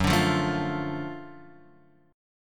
F sharp Minor Major 7th Sharp 5th F sharp down Guitar Open F; strummed